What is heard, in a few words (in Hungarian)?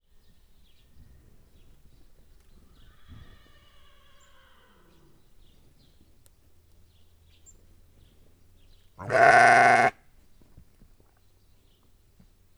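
begetés elfutó nyáj; parasztudvar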